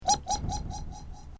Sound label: Created Creature Small Ping, Alien Sound Effects; Created creatures, vocalisations and pretend speech